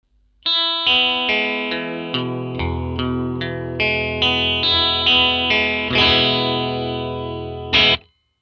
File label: jag both, vintage and reissue jaguars; sound samples of vintage and reissue jaguars and jazzmasters